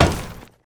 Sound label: ladder, footsteps; player